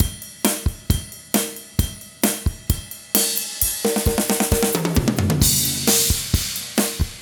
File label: roll, 133bpm, Rock Heaven Drums; Quazibeat